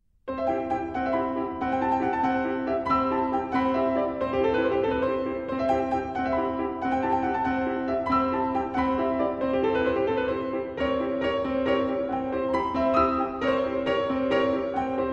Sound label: Valse in C